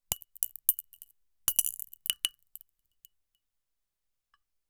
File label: crystals falling on the g